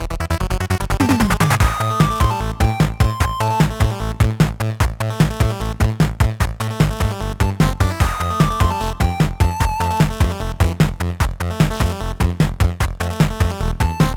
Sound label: CHIPTUNE; AULART